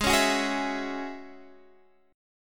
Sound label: Ab 7th Suspended 4th Sharp 5th down Guitar DADGAD